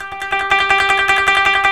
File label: KOTO TREM